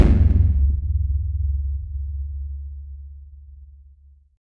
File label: a large ancient drum that